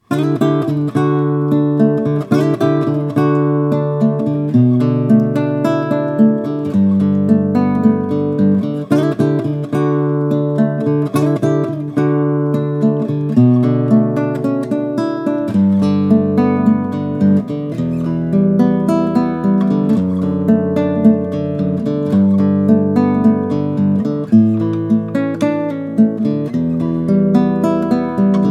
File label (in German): Pop Ballade Mikrofon